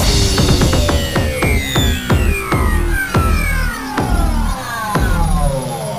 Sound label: Music Stings Transition